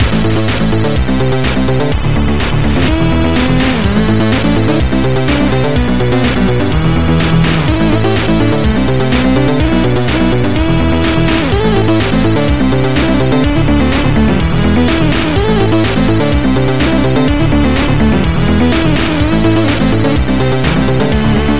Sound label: keygen music